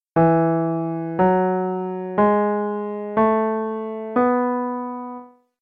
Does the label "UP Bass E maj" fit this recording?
Yes